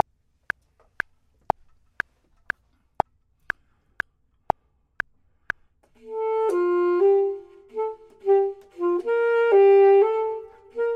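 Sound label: top, Saxophone and Clarinet; voxman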